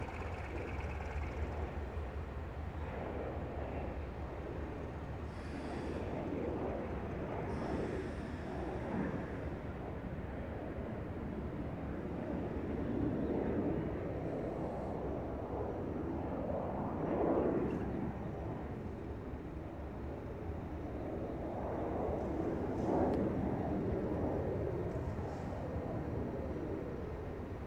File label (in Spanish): gare central Buenos Aires